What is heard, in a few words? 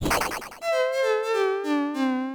Cowboy Dead; Player Dead; JotPK